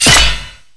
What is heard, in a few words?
tooth and nail